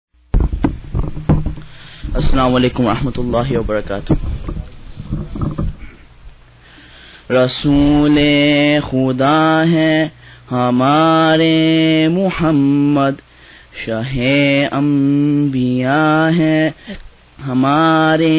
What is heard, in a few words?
naat